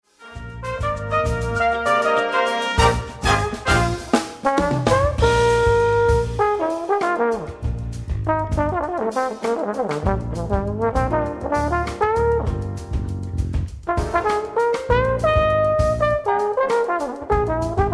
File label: tbn solo; trombon solosu